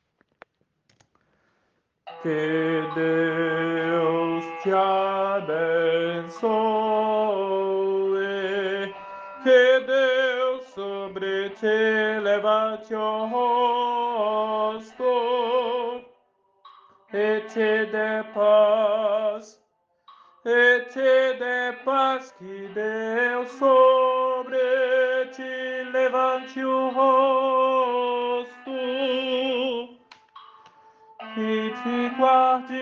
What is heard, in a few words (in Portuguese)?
tenor; bencao aaraonica